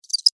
Cricket Chirp, Cricket, Chirp, Single, Insect, Bug; Sound Effects:Animals:Insects; Crickets; Blastwave